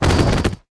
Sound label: Devil punch